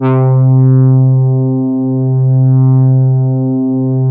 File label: MEMORYMOOG